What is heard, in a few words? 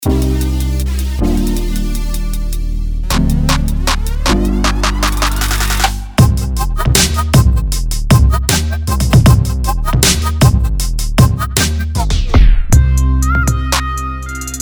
Trap Serum